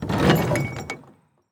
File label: Semi Trailer Door; Large Vehicles; Blastwave